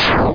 Activate Sound; SpeedChopper

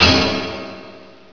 blocked, weapons sword; chaos

